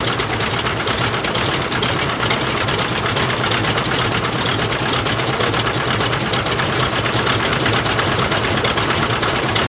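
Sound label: DHC Idle Loop From Start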